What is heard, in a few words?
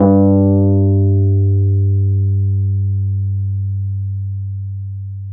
G, FEND KEYBASS; Sonic Reality; Vintage Timetraveler